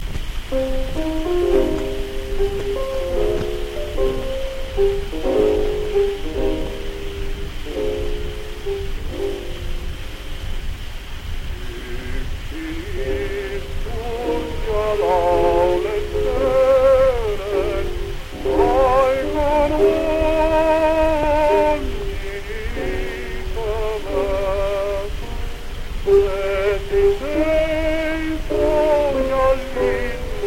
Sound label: Gramophone